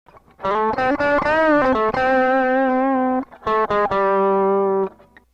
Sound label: OD DIST